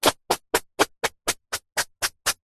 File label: household hairbrush comb brush short hair fast short strokes; zapsplat